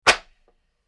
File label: flap sound